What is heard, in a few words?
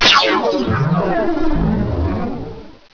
Warp sound; Bedlam 2 1.1.1; Bedlam Sounds